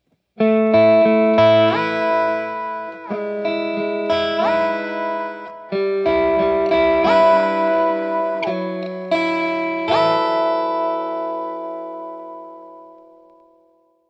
Chord

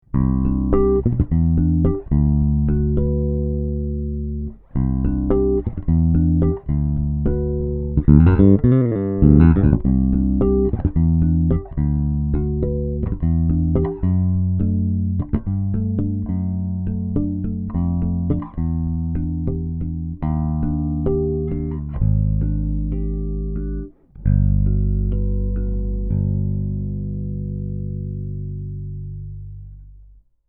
Vintage; BH500

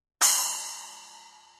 Medium

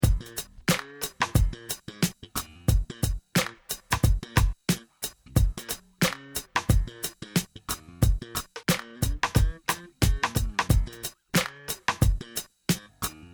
Multi Comp

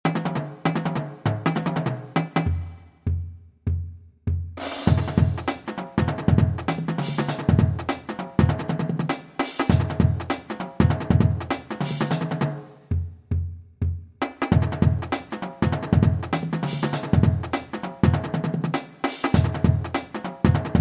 HipHop

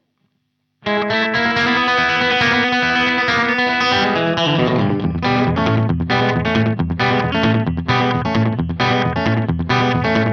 Echotone Country; Carl Martin

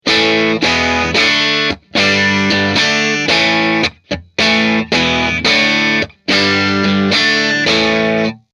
Drive Clean; MOLLER

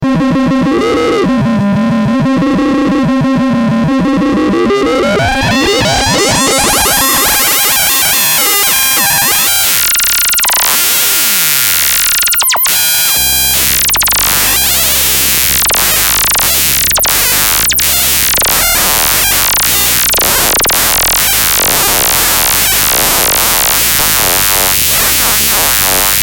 Korg MonoTron Tweak Out

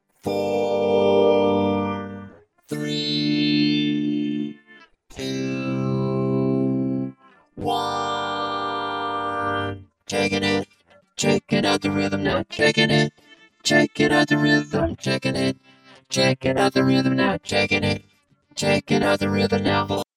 Vocoder Guitar

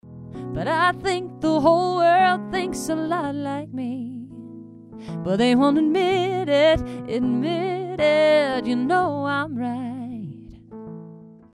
Dry; VoiceTone T1

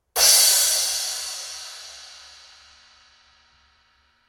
crash

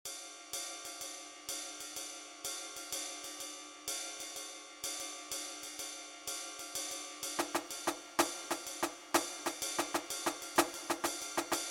Swing Time

